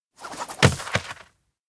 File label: friskbee drop ground; anemoi